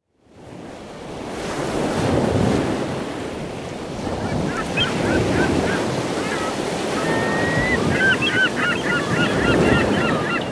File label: Loop